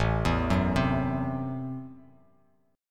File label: A Minor 6th A, Chord; Am6 arpeggiated; 5,7,4,5; JGuitar